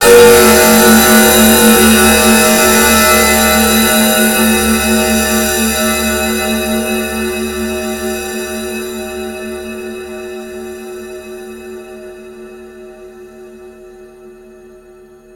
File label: Scary Drone